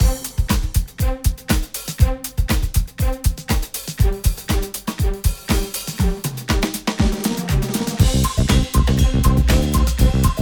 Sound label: bonus music loop; genesis; euro Golden Cup